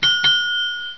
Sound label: GAME START; luxurycar